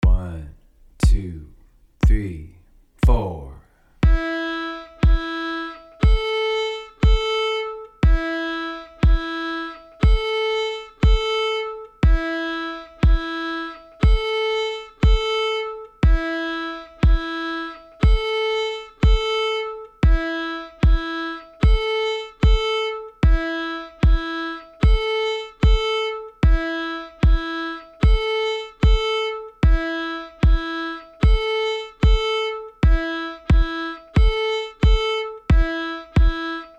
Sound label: Intervals